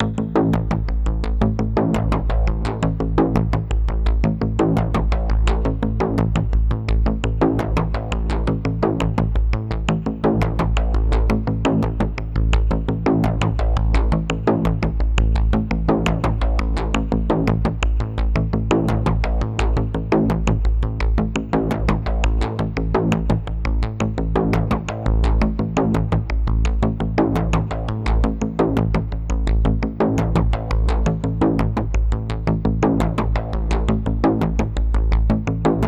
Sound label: arp bass cinematic tension; gerilim; By Burak Sound Bank; tension vol 52